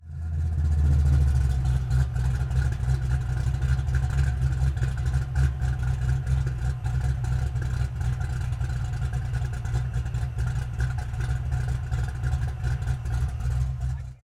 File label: Car Show